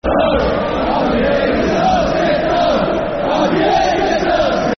Sit Down And Behave Yourself, Football Chants (Soccer Songs), Cardiff City; fanchants-com